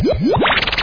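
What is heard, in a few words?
cartoon sound of popping bubbles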